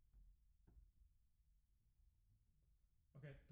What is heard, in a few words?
Piano Mono